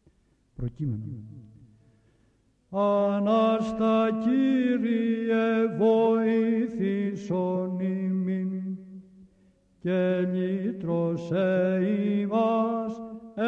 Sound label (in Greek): ΠΡΟΚΕΙΜΕΝΟΝ, Psaltichie